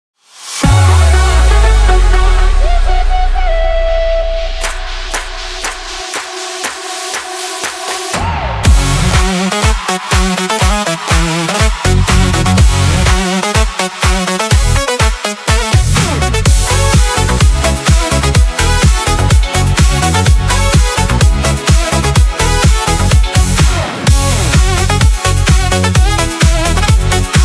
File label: Ievan Polkka EDM; suomipolkka